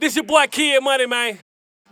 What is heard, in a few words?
VOX; Loopmasters; Keith Mackenzie & Fixx Present Booty Breaks Vol.2